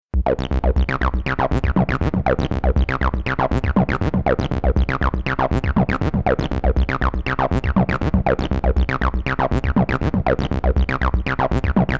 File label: Sequential Trigon 6 Silver Saw